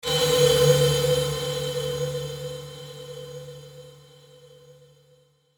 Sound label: Metallic Ring Sweeper Reverb; Metallic Sound; Blastwave